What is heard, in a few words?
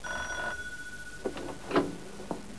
PHONE, 电话; 特酷声音素材库